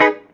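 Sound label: guitar chord G# minor, roots reggae; Sounds Good - Roots Reggae; GTR CHD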